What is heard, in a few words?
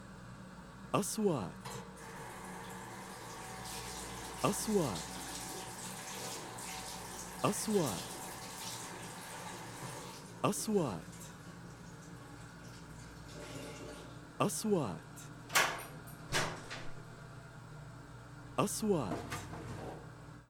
bathroom handwash washing